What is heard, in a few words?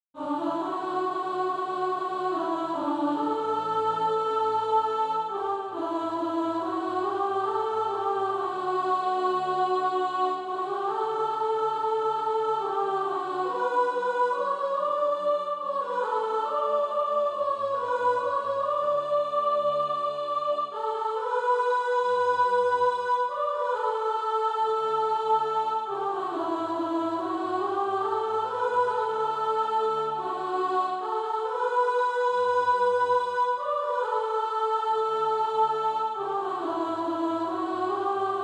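soprano, SATB Practise Music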